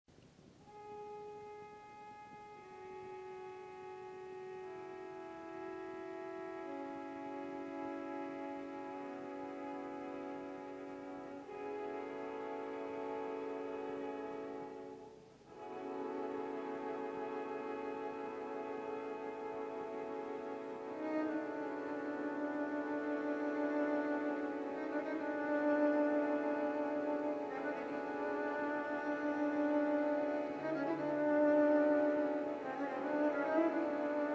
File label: String Quartet, Non troppo lento